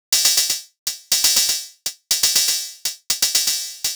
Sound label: closed hh